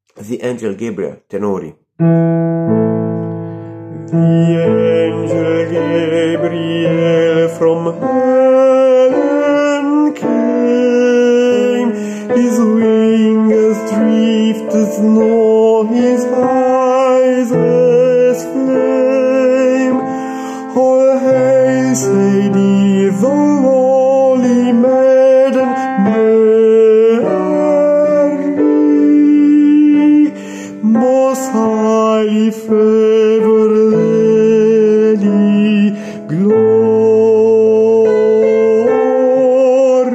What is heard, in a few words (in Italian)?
tenori; angel gabriel